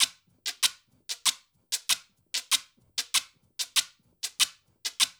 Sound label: percussion loop; InVision Interactive; Percussion Slam